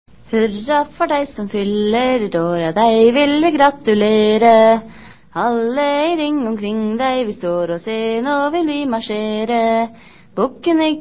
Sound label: birth song, Norwegian